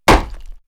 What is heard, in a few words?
Wood head, perc fx; X-Static Goldmine